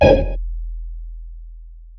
Hats, Alesis Performance Pad

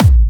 Trance Kicks

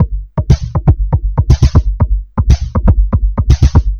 Drums Strange

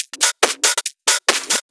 Loops 140 BPM Hihat Percussion Loops

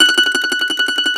MAND TRM, Mandolin

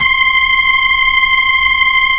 B-3 Chorus

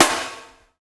snare need; Roland L-CDX-01; Snares 6; Sn Modules 6

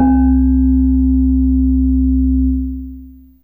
Glock C2, Fairlight CMI IIe, Mallets

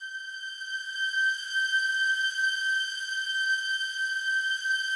slow strings G5, Yamaha VP-1; 90 s Sample CDs; Music Store

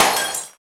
RAGGA; AMG - Now CD-ROM (Roland); Soun'Bwoy!; Ragga Samples

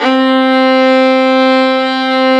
violin C3, Orchestral; Sound & Vision; Gigapack I CD 2; Violins

